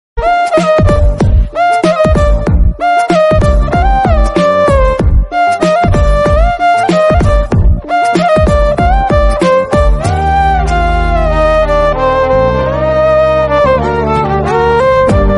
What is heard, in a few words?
background music